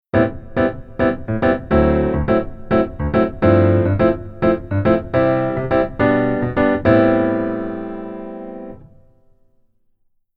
keyboard rhythm

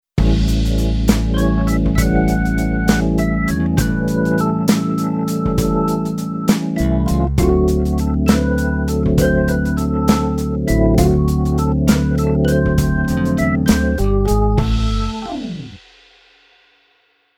Keyboard Organ Blues

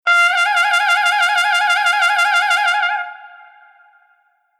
Horn Shake

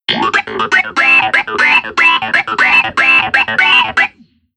Keyboard Clavi Wah

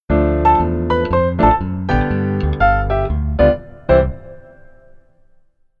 Keyboard Solo Left Hand Ba

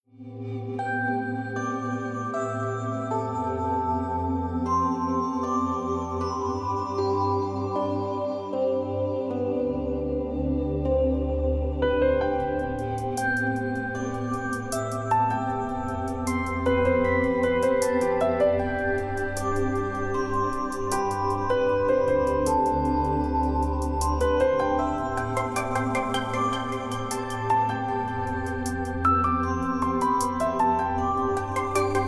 FutureBass Rough Arr

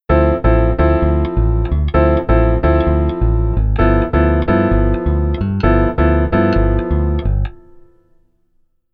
Keyboard Rhythm Basic

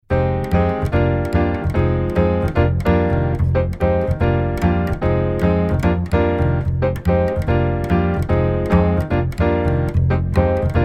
Jazz Waltz Bass

